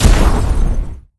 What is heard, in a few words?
enemy Flying Buzzer Destroyed Explosion; gamerip; Mars Sortie